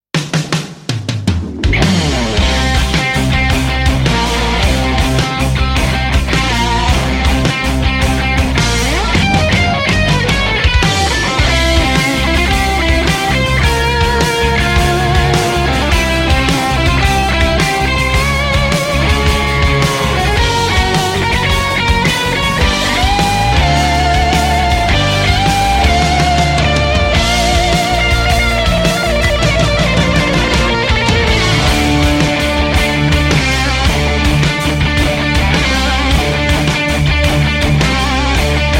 (Cover); Angry Birds Space

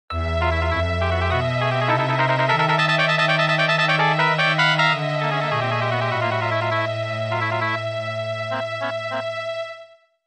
Tense; 3D Movie Maker

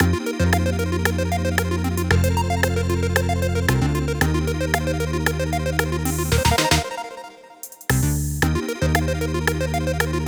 Jingle Option Top; Taiko no Tatsujin Atsumete Tomodachi Daisakusen 2015; Wii U

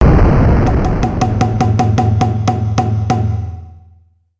Start Jingle

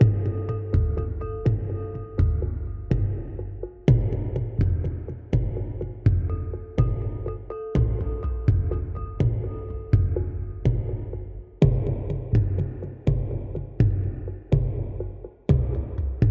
Mountain Music, Finale Layer 3 Drums

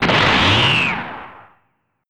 Transformation

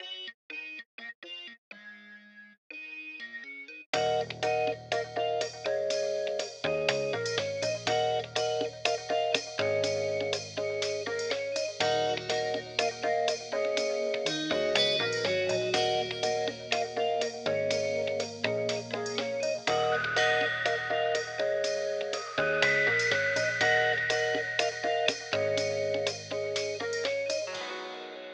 elevator; Starcrawlers

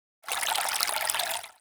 Watering can

